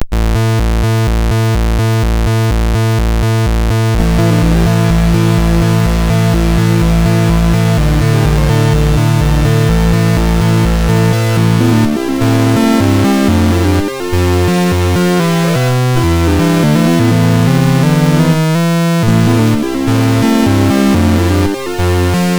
Shackled, MOS6581R2

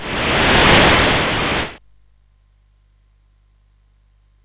sid CSG8580R5; Jumpman Junior

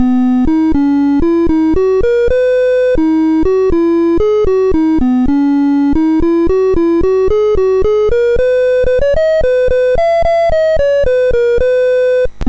sid MOS6581R4; Magic Jigsaw BASIC

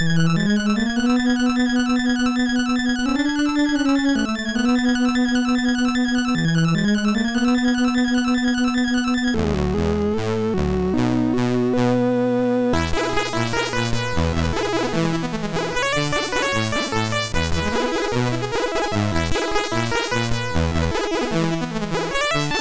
sid MOS6581R2; Jetsons

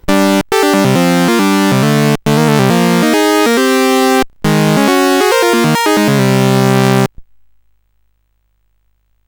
sid CSG8580R5; Repton

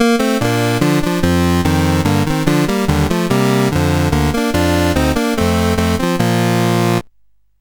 Summer Games, CSG8580R5

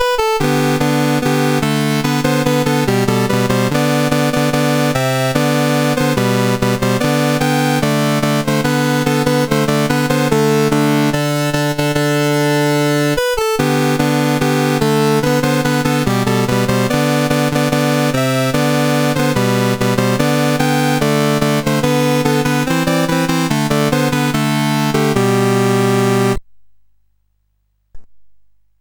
Summer Games, CSG8580R5

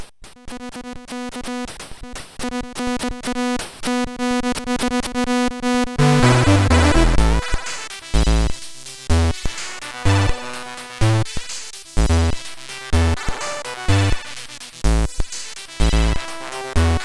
sid MOS6581R3

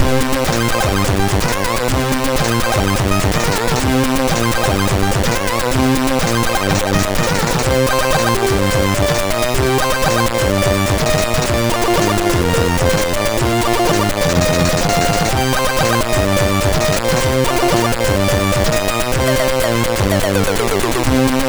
sid MOS6581R3

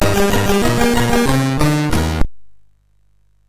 Tilt, MOS6581R3

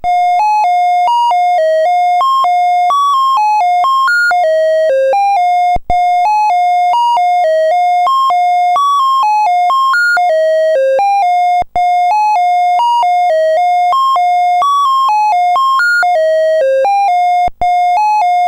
UFO I BASIC, CSG8580R5

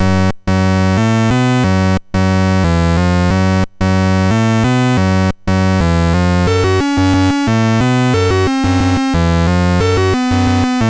sid MOS6581R3

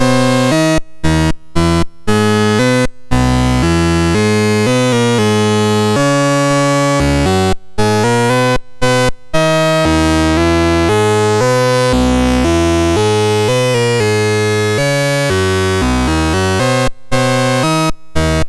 MOS6581; Hyper Blast; MOS6581R2

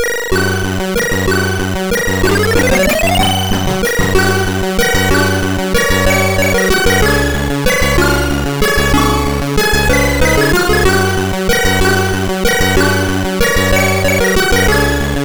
Crack Intro, MOS6581R4; Ikari Cybernoid

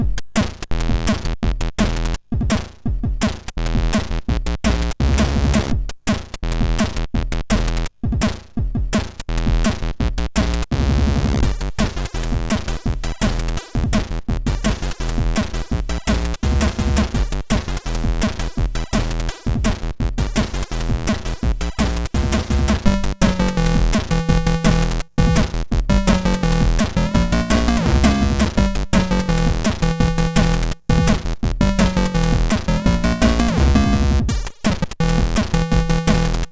sid MOS6581R3

